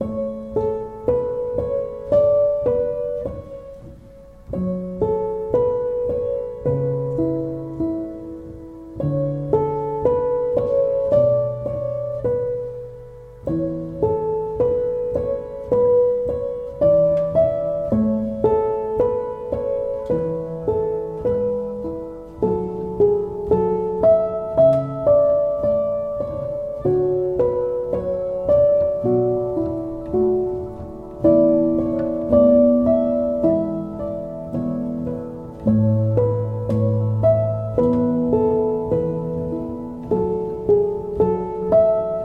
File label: song calm nature; dolphin tunes